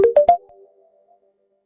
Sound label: notification; decocms